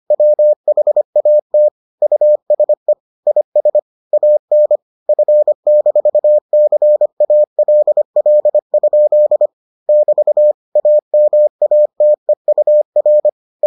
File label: morse